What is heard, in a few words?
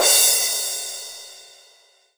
Roland SC-88 Crash Cymbal